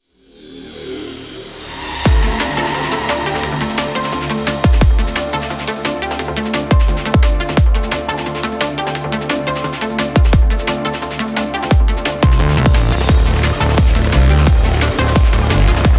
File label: Battle; Scar